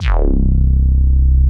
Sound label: Sub Boom Bass, Synth Bass; Ableton; Factory Packs; Beat Tools